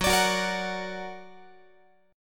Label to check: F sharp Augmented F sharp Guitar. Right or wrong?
Right